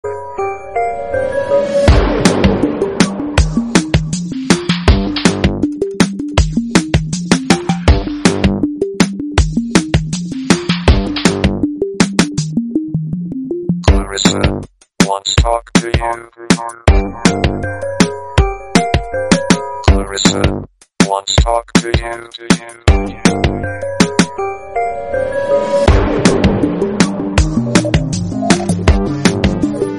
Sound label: ringtone